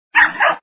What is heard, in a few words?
Dog 2 Barks; hopes babysitting maze